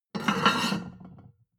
plate on plate place slide medium, restaurant cafe; sport-freizeit